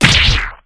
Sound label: Samurai Slash